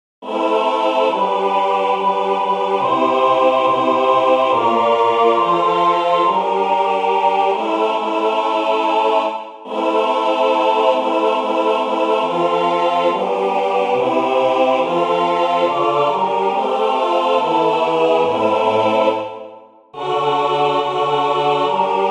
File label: tutti; les 7 paroles